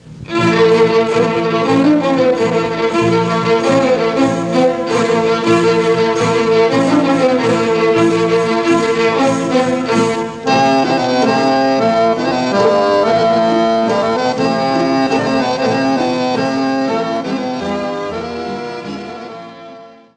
Elenska Rachenitsa, Bulgarie

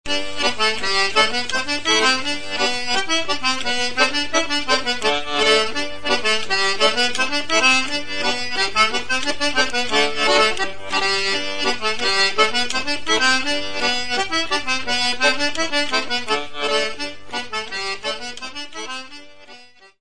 Scottish, France; Scottish de Cernay

